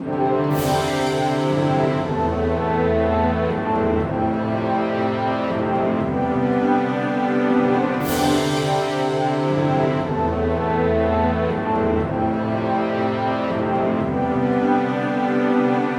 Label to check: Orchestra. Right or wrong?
Right